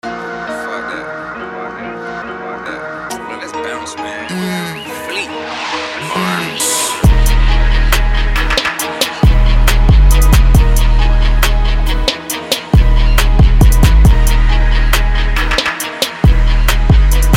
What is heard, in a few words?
Instrumental